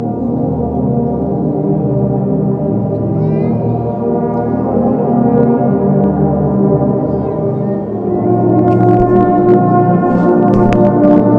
tuba Christmas concert; silent night

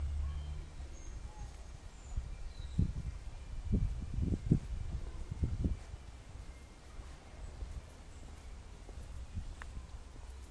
sound of pipe band practice on the way home

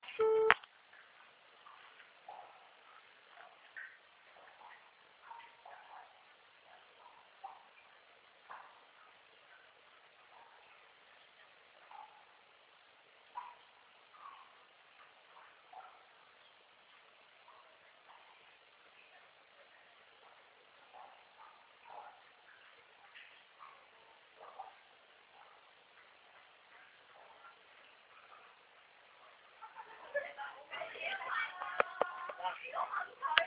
dripping water in kamikaze grotto

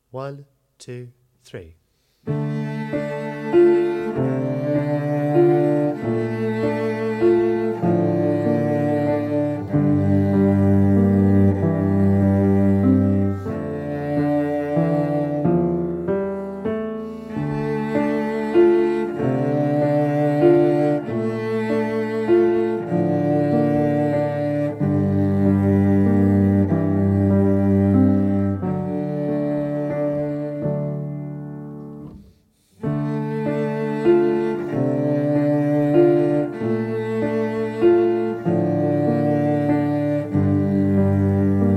in flight cello

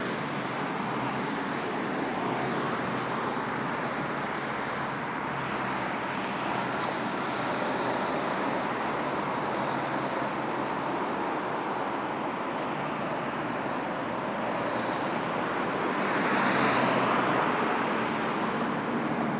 sound of cars driving by

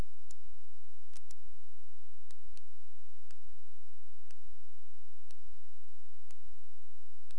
sneeze

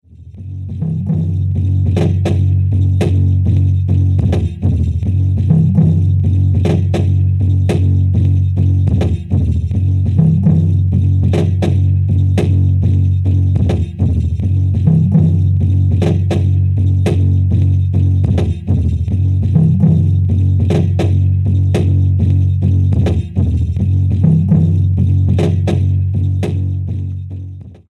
cajonlimba

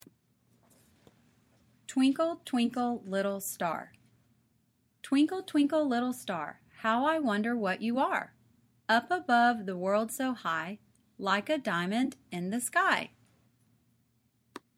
twinkle twinkle little star

